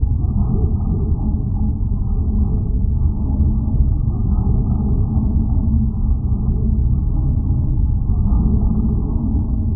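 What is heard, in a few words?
ambience mining facility loop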